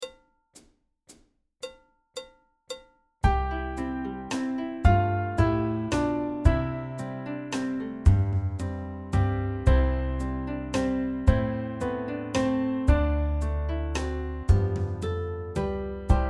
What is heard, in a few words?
Backing Track; home on the range solo